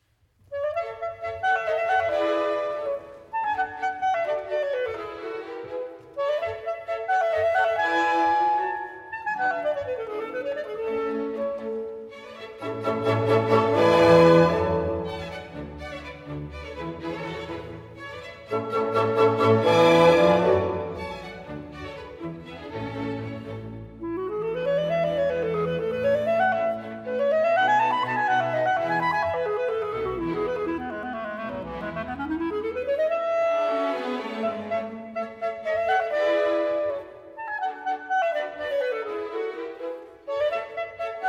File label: clarinet concerto in a major allegro